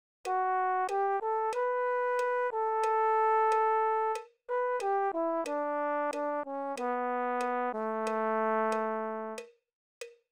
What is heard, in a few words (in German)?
Rhythmus Ubungen